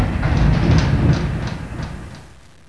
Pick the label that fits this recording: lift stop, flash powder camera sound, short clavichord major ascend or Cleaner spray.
lift stop